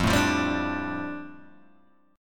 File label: F 6th Add 9th Guitar Open G, chord; 3,2,3,0,3,0